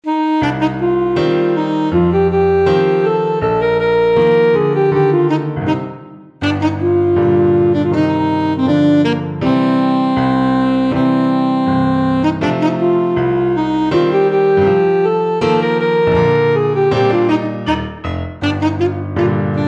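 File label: romanian folkdance, alto saxophone; bartok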